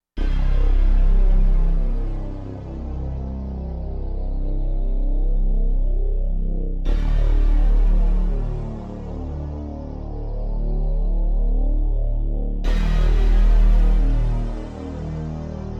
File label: drill